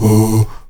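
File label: ahoo, staccato oos, Africa; Spectrasonics; Heart of Africa 1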